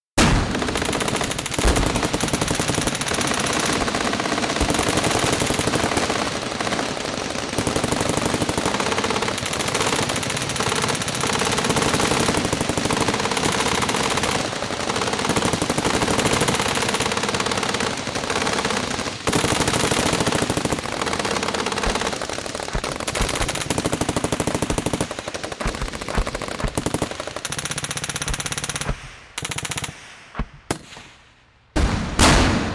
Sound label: Battlefield atmosphere (Close perspective); Battlefield Sound Effects; BBC